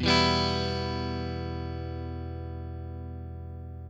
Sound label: CHORD LN M, guitar; Quantum Leap - Guitar & Bass